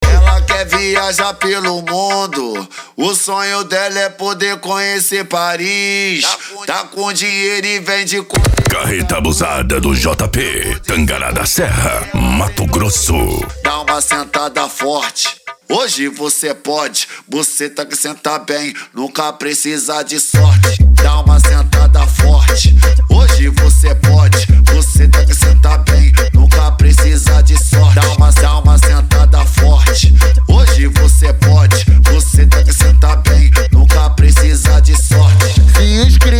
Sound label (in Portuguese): funk mega bass